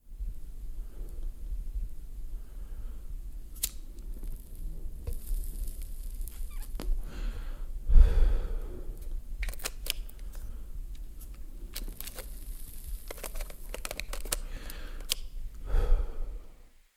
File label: movement of static; naegleria